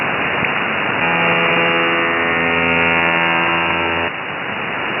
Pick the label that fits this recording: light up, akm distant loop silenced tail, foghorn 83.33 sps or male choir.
foghorn 83.33 sps